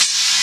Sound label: whoop, Percussion, Swish